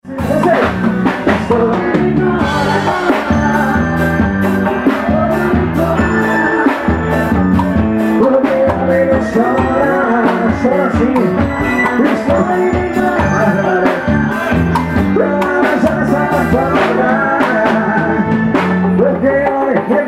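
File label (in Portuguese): forró ao vivo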